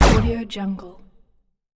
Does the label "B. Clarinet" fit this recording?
No